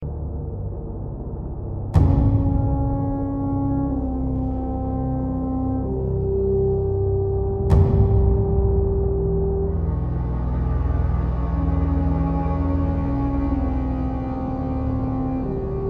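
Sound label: Dwarven Instrumental Ambience - Throne Hall; Demons Rise: War for the Deep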